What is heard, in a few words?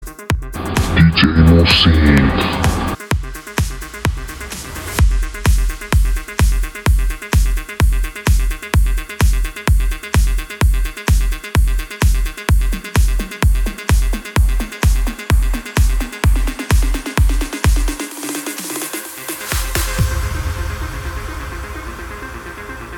Electro House